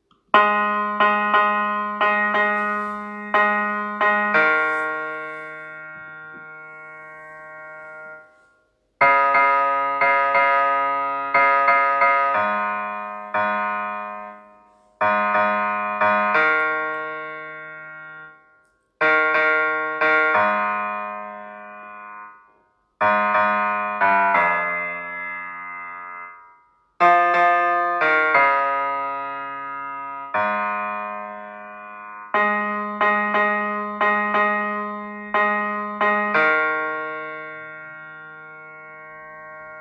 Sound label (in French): Basse